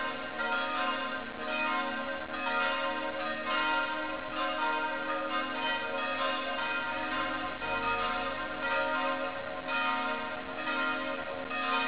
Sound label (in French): cloche volee 4 cloches